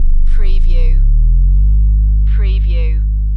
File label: Techno-Trance Sub Bass Loop in G 142 BPM